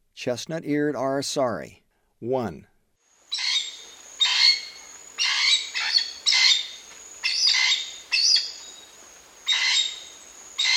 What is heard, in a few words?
Chestnut-eared Aracari; S. America; Eastern Lowlands